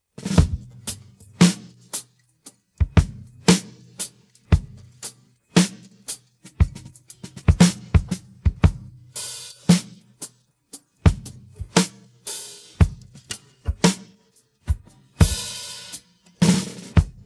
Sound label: pattern, drums